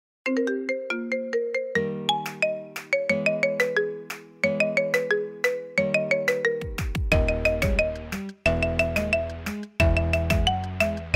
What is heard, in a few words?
Marimba Remix